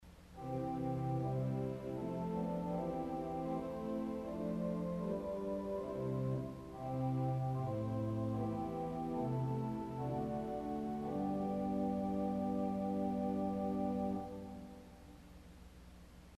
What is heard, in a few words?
Erzahler Celeste St Anne, organ stop; CulverAcademy